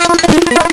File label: wavetable; wavetables